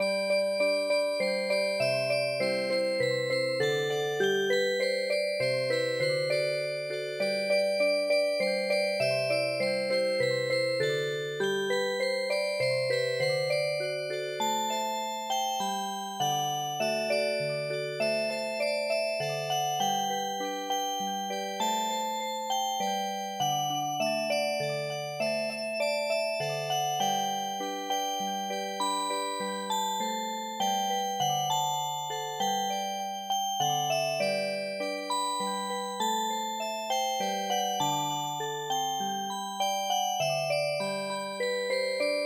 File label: Music Box, midi traditional